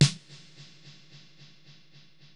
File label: 505 Drums, Roland TR-505